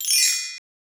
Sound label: Roland Juno D Perc Menu Kit